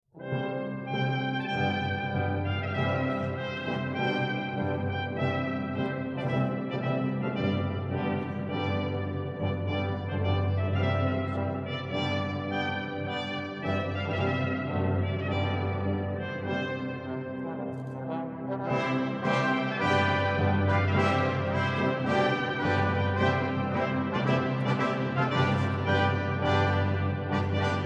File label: March, orchestra choir brass percussion; Lully; schnifis